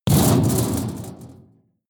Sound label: metal impact sheet bright rattle hard; hits explosionen; metall